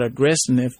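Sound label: aggressive sniff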